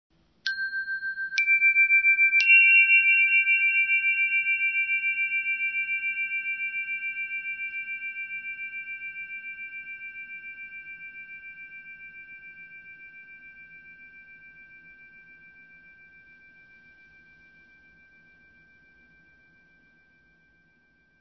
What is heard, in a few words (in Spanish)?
Campana; Zenergy